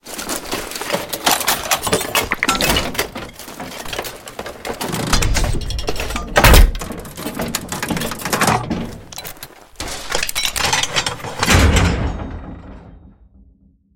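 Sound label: prolonged sounds of collapsing metal structure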